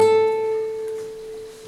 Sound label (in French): La3 guitare; TerminaleS